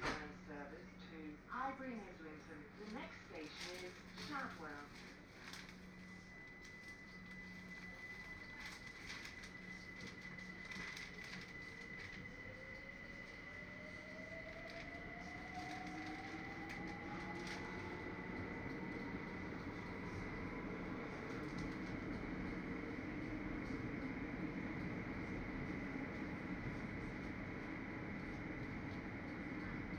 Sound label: tube, scenes; IEEE AASP